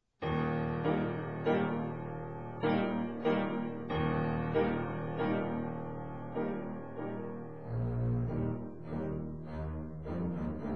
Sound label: double bass; western